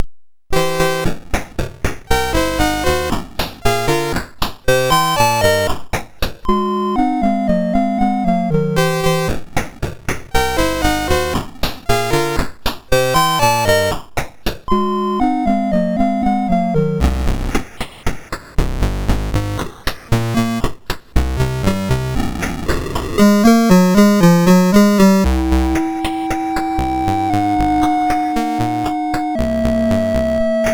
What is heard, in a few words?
Slam Dunk, sid MOS6581R2